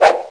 whoosh; Desktop Themes 2000; Comic; CC theme

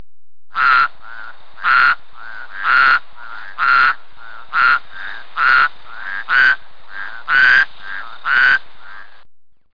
toads

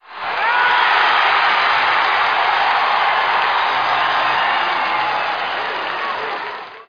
clap, stadium, hockey

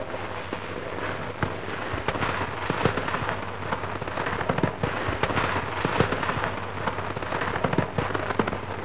car snow; Cross Racing Championship; CRC 2005 Demo

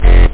303 analog; tb303 samples

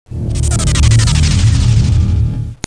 shuttle; Starcraft; protoss

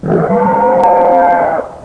dinosaur roar; PUZMANIA

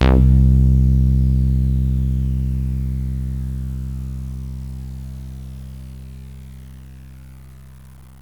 Synth Bass; GMPlay

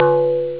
cowbell; OctaMED SoundStudio V1

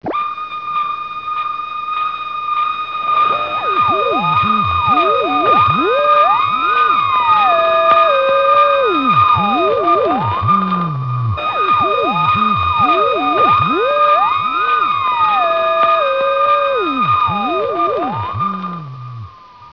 Alien Trans; WARLOCKSNDS

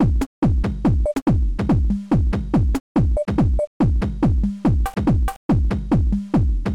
jungle house (142 bpm); octabeats

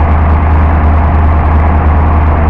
V8 Big Block, Motoren; GameStar Special 2004 March; Patches; WorldRacing; slipstream

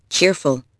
CHEERFU

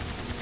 chicks; Web Clip Empire 150,000

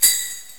Jingle Bell, Drums; TG300B

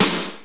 SD Rock M; GMPlay; Drums; XG

